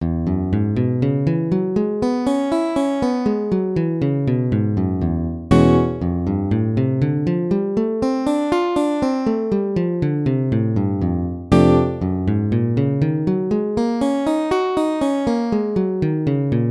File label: arpeggio